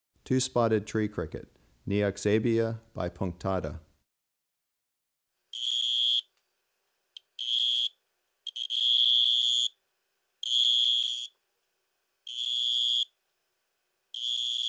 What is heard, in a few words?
Twospotted Tree Cricket, Neoxabea bipunctata; Crickets and Katydids